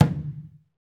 Skin Drums

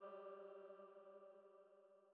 Female Pad G2

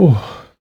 BEATS, Human Perc; Body Perc

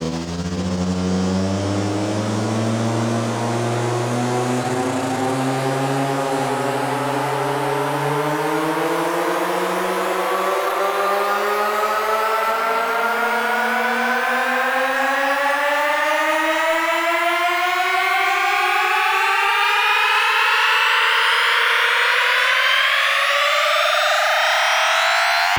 Motor Riser; Antidote Advent; Infected Track Drums Ableton Project

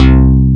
BASS, DANCE

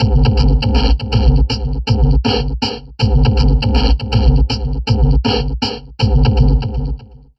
Drum Loops Crazy Processed

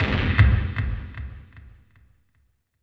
NASTY BDRUMS; Zero G; Malice In Wonderland

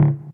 Stabs, Elektron MachineDrum SPS1 MKII

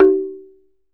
TUNG HI, African Perc

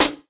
Snare, jungle breaks drum sounds

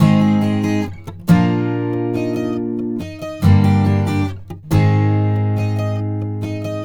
Acoustic Pop Guitar 140BPM Cmin; Vengeance - Essential Clubsounds Vol.4

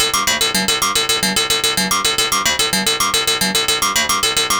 ARP2600 D; AMG - Vince Clarke Lucky Bastard

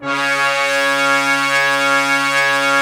BRASS C#4, STEREO BRASS; Greytsounds VOL-1

